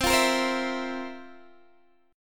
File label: F Major 7th Suspended 4th